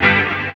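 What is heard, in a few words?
guitar, left; East West - Phat & Phunky CD2; 90's Sample CDs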